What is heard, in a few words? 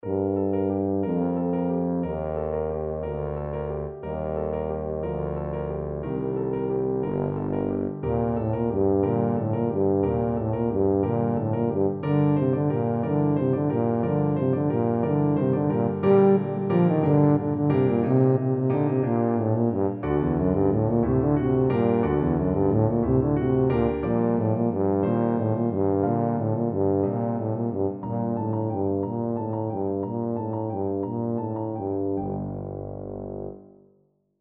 carol of the bells, tuba